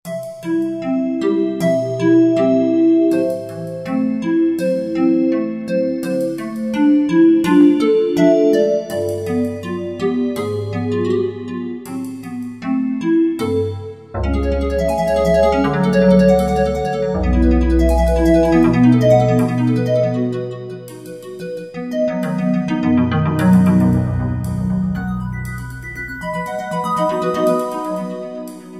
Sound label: water harp